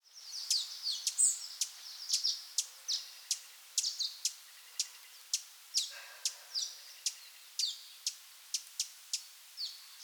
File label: Rufous Hummingbird